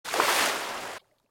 Water and Aquatic; Water and Aquatic Bible; Splice Sounds

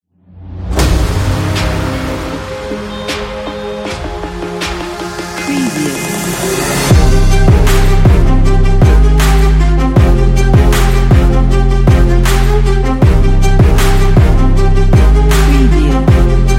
epic hip hop beat

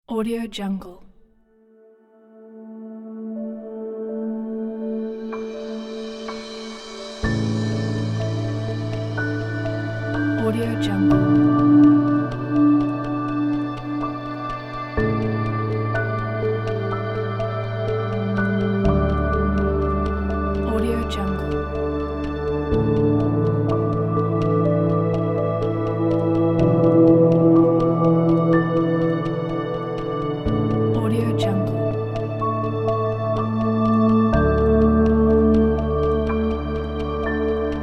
atmospheric background ambient documentary

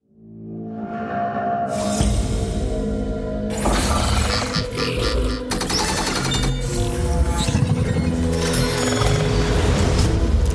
hybrid game sounds

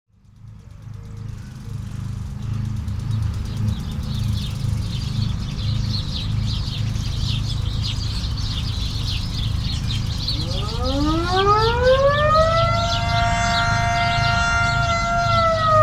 town country sound fx; BOOM Library

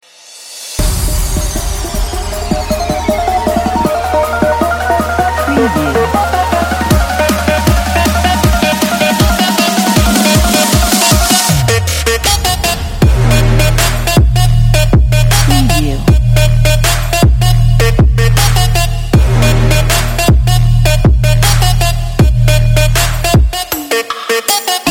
trap background promotional

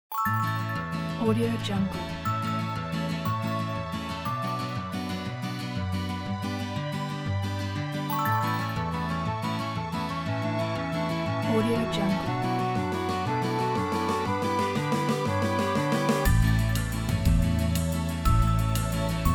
Bubble Bells; DorianSounds; AudioJungle

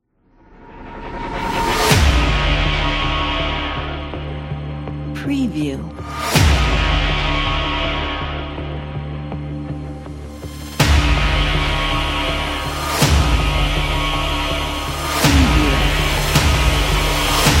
blockbuster trailer

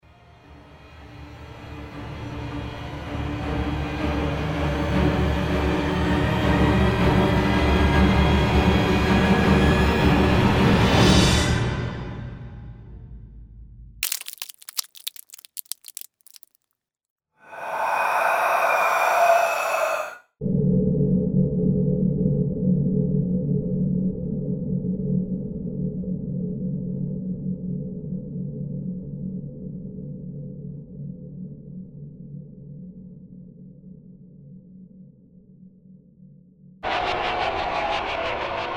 horror sfx

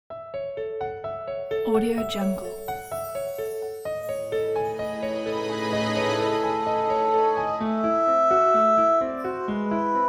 beautiful nature ident

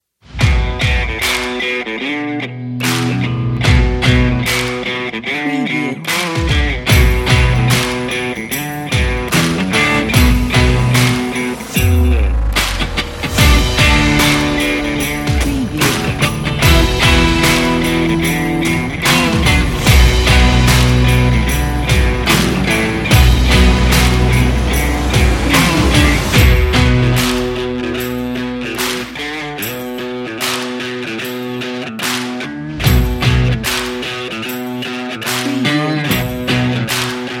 cool rock

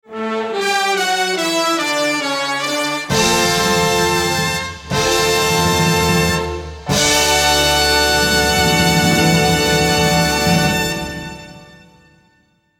award show fanfare logo

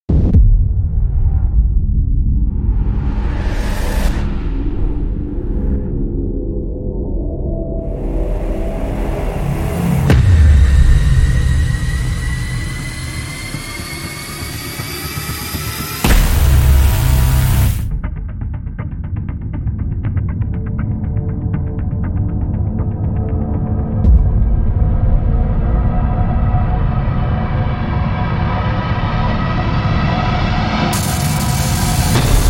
Cinematic Darkness Trailer Sound Effects; BOOM Library

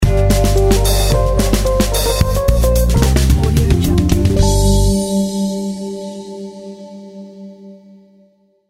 action logo

